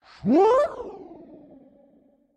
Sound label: Dash